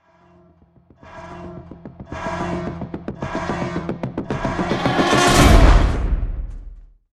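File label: music, string pulse swell; transformers war for cybertron gamerip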